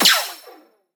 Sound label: Explosion Ice Transient; boomerang fu